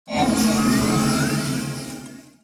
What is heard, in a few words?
wizardry